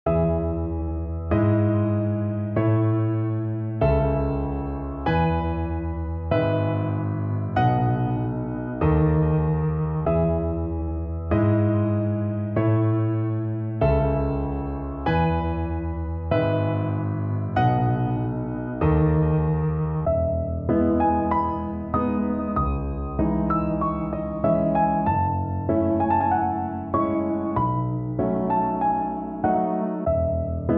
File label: Cocktail Piano Upscale 96